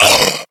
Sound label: spider hit; cstrike